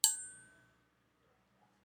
Tin

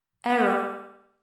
Synth Error

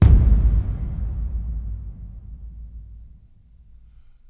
Cinematic Horner Impact

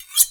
Blade Slice

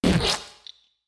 Vocal Splat

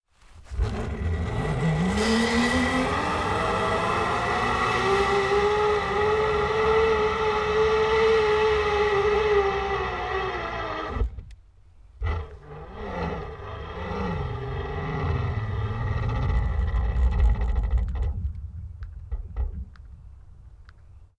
zipline run from top

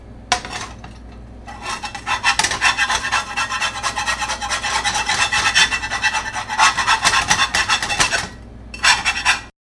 Scraping Pan

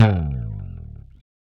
Bass Guitar Sliding Down

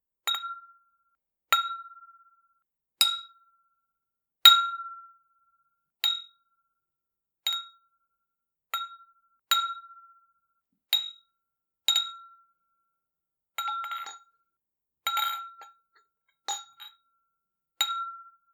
Glass Cheers Champagne

